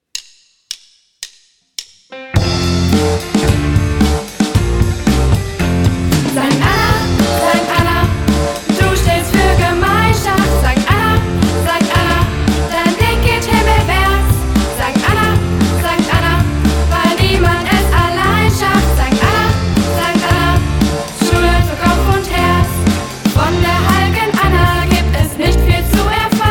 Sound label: song; st anna